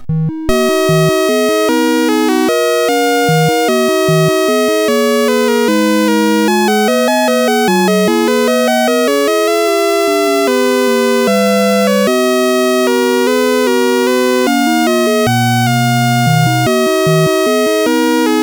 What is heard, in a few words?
sid CSG8580R5; Lunattack